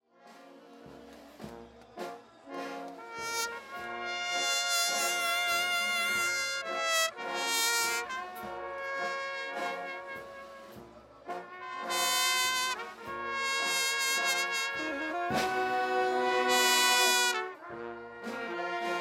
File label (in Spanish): procesión banda frase; SEÑOR DE LOS MILAGROS